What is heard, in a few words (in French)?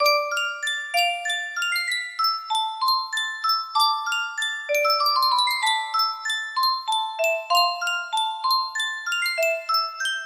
yunsheng boite a musique